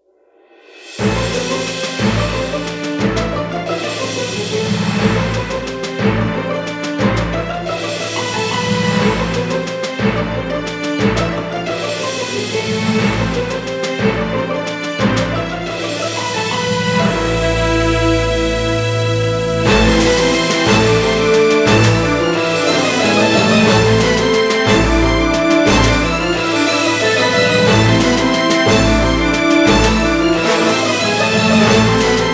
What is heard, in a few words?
Combat Theme